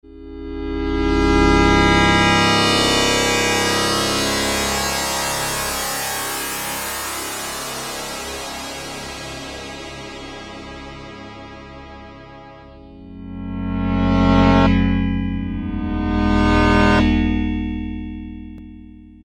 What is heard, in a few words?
FILTER SWP, Yamaha DX7